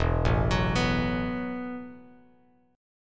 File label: E Suspended 2nd Suspended 4th E, Bass Standard